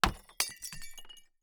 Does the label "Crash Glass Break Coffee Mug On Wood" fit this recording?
Yes